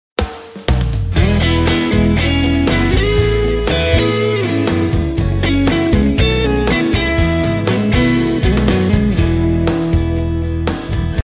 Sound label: Instrumental Sample